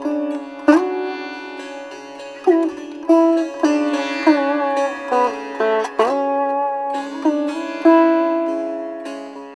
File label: SITAR; Spectrasonics; Heart of Asia